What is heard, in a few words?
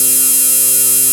RAVE BEEP; Best Service Alpha Dance vol.2